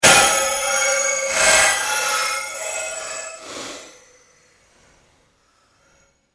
pulse fade, Sound effects created by manipulating other sounds; Rises and Dives